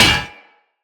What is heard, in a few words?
Melee Hammer Impact Generic; Weapons; Impacts; Hammers